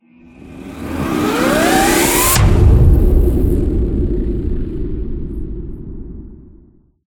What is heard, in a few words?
Transition; Everspace 2